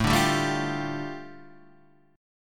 A 7th Suspended 4th Sharp 5th, A, down

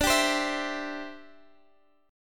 C Major D

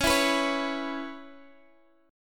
C sharp Minor 7th C sharp Guitar Standard; 21,19,21,18,x,x; C#m7 strummed; capo=3

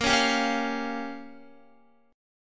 A sharp Minor 6th, Guitar; A#m6; strummed; x,13,11,12,13,12

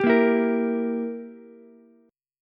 E Diminished E, Ukulele; Edim strummed; JGuitar

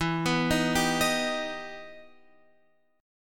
E Minor Major 7th; x,x,x,9,11,10,9,12

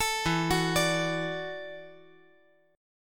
E 7th Suspended 2nd Suspended 4th, down

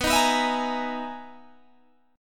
B Major 13th B, Guitar, Standard; BM13 strummed

